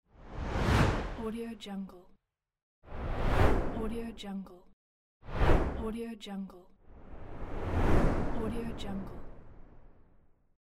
woosh

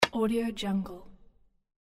click bamboo

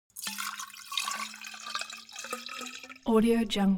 elements water carafe refill; smartsoundfx

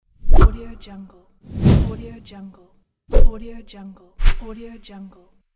whooshes; danielmix

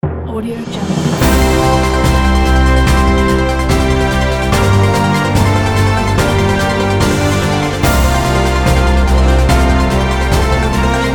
awards opening logo

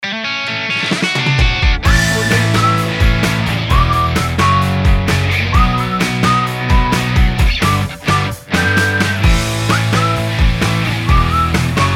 happy rock logo; cyrilnikitin

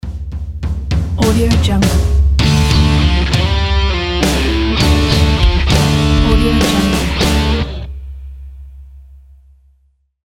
scene transition rock logo

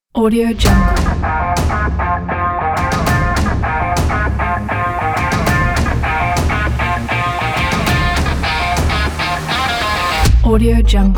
sport victory big beat

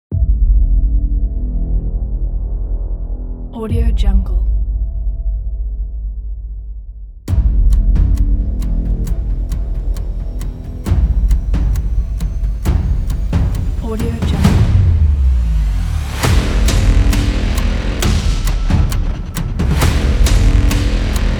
countdown action trailer

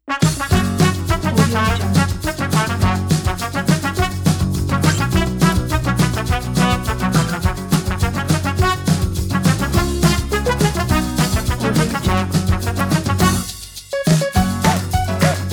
funny quirky comedy

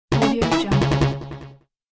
arp bonus notification

